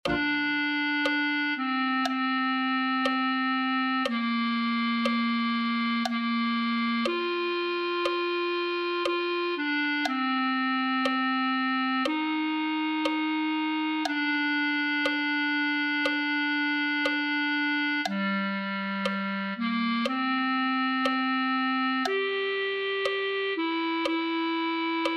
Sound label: clarinet